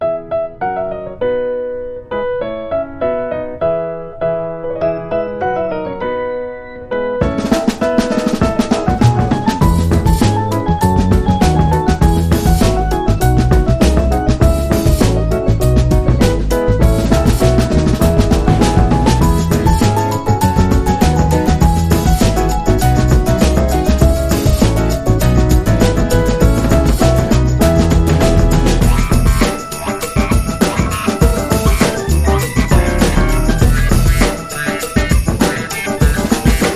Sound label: Ding Dong Funk